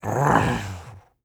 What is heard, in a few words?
Animal Mammal Carnivore Dog Labrador Retriever Growl; animals; AdobeCC